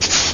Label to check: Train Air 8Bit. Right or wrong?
Right